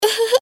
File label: Laugh "Ehehe"